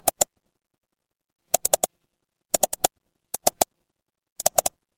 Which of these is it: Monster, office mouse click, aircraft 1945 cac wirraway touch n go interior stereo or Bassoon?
office mouse click